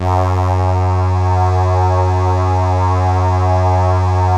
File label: CRUMAR; Masterbits; Vintage Voltage